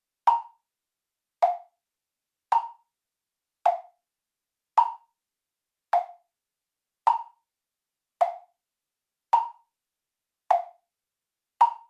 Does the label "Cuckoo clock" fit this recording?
Yes